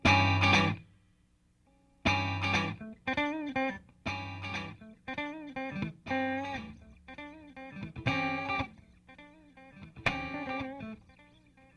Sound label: Echo 2 Second Delay